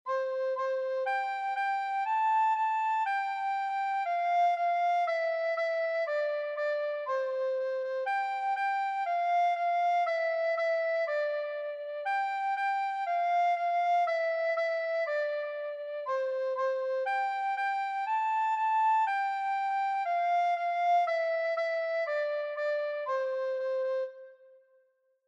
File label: Twinkle Twinkle Little Star Recorder